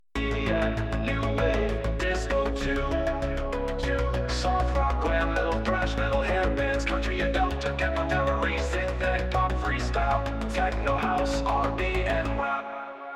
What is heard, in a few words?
80s Party Synth